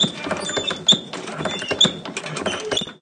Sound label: grind wood; Machine